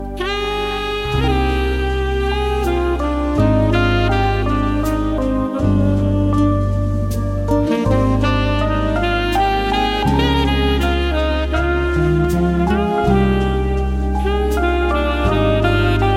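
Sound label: never ending music; blues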